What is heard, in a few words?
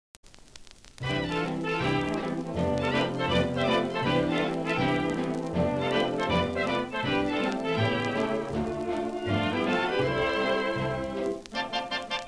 Orchestra instrumental; Jazz; 1932; Popular Jazz Archive 1920-1936